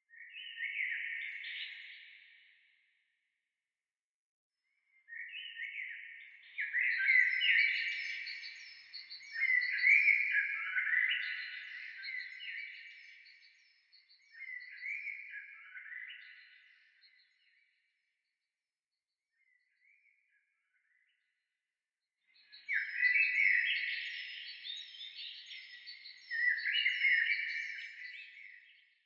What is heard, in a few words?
Birds Chirping